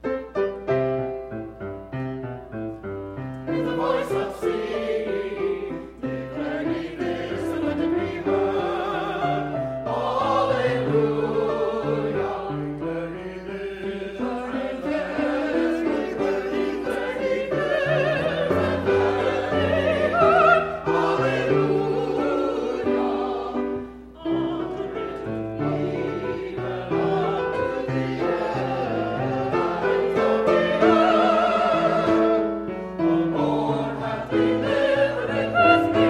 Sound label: with a voice of singing